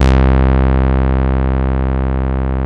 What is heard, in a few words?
RAW SAW, BASSES; Masterbits - Vintage Voltage; VV BASSES